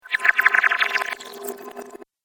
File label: Water - Backward Bubbles; Cartoon Sound Design Sound Effects